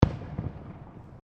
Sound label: Fireworks Single Explosion; Fireworks (Sound Effects:Backgrounds); Crafted Signals